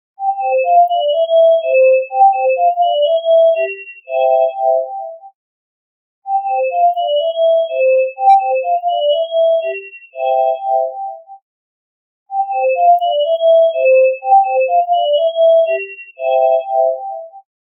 iphone cell

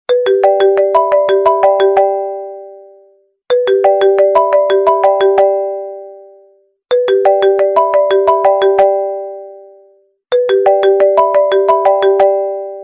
iphone original

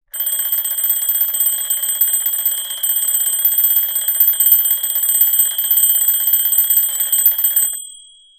Twin Bell Alarm

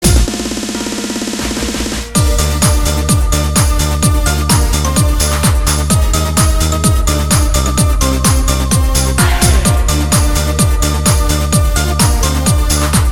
jingle bells remix

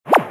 outgoing sms

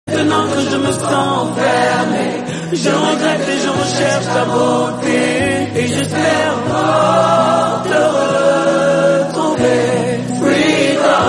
Soprano

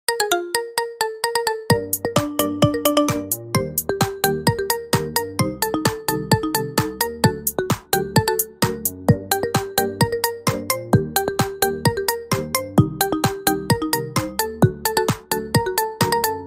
iphone mix edm

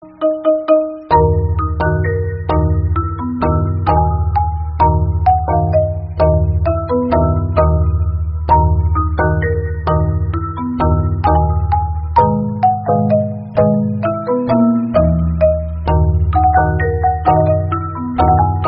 Marimba

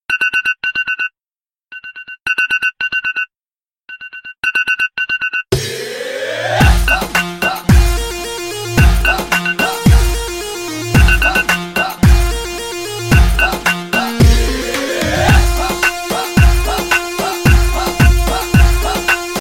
dabke alarm

